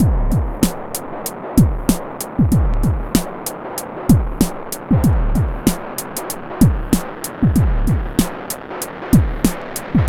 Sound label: 33 DOOM BOOM 95.2 bpm, Loops Breaks; POTBreaks